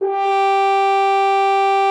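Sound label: French horn G3; Xsample VOL-3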